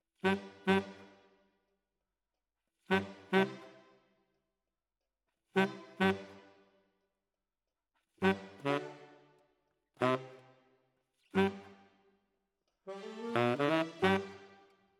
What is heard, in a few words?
Tenor, RNR for piano